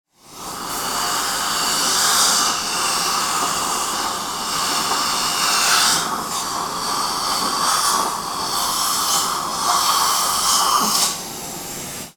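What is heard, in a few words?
sounds of saliva ejector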